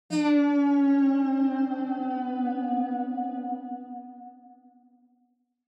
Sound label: Onoma Negative; オノマトペ ネガティブ